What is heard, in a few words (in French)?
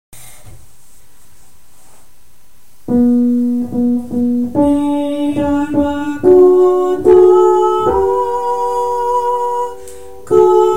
ténor